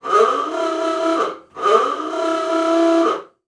Transportation Car Ford Model A Honk Horn Mic In Rear Multiple